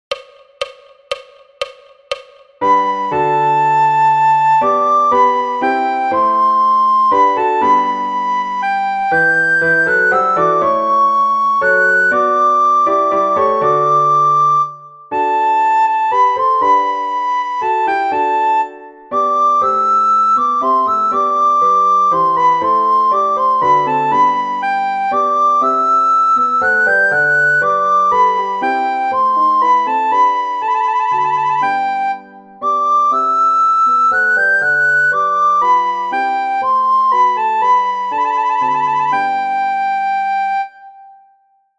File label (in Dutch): sopraan; La nuit; Rameau; Blokfluitles